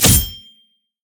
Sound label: riposte block; LoL; fiora